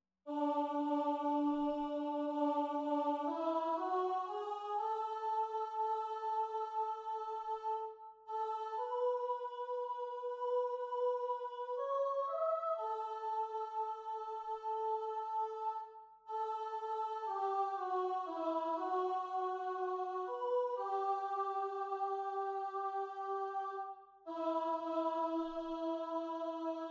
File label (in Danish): sopran; korsats